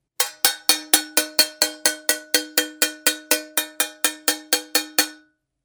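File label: saucepan sound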